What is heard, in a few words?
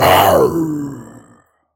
growl; soundscrate